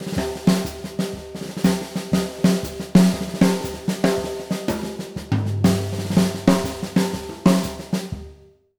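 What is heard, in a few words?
breakbeat, 120; Jazzistic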